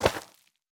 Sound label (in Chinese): 下界疣踩踏声